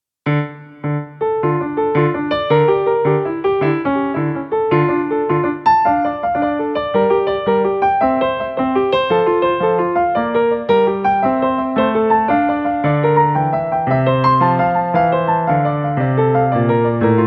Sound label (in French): piano, prise mono, prelude de bach; prelude de bach no6